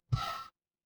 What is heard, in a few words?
feedback fail